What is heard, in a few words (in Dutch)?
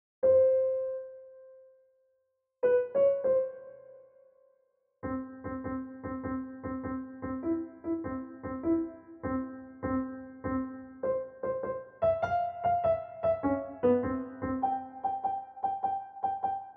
pianobegeleiding